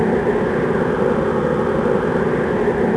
WIND; XBOX; Crash Bandicoot The Wrath Of Cortex

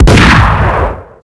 shoot sawed shotgun; weapons; Max Payne

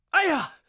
Cry HURT; TrueCrimeStreetsOfLA; XBOX

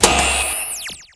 bonus; Pure Pinball

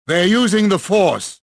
anger; jedi

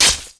stab; Soldier Of Fortune II Double Helix; XBOX; ak74

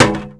white chair hit; bouncyobjects; Tony Hawk's Project 8; XBOX

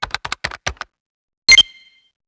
security access granted; asgard

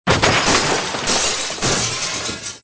glassbreak loop; bullet impacts; Soldier Of Fortune II Double Helix; XBOX

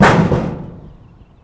metal imp; Austin

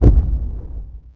Foot, Aliens

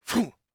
attack voice; UFC Tapout; XBOX

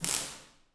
spark republic cruiser; Star Wars Obi Wan; XBOX

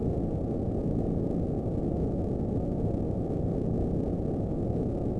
amb Hall; maul; Star Wars Obi-Wan; XBOX

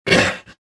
land1

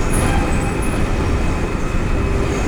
engine room, cargoliner; Soldier Of Fortune II Double Helix; XBOX; ambience

